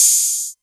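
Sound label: 808 Hat Open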